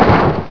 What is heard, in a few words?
concrete, debris; Source Engine; bshift